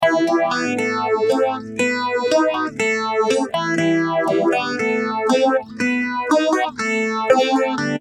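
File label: acoustic guitar deluxe phaser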